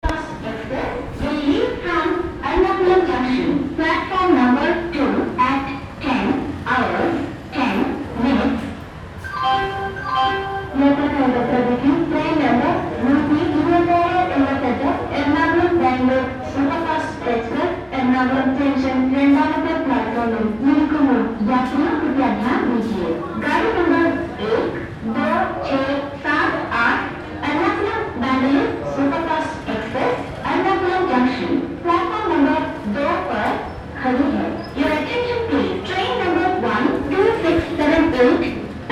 train station ernakuum, South India street recordings